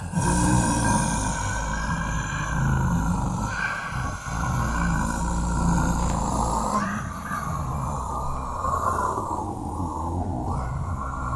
Grumble

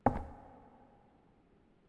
Footstep Wood

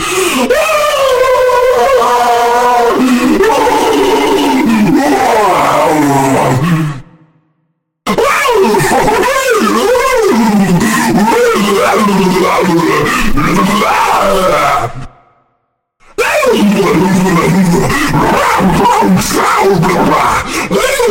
Goofy Scream; Ambush

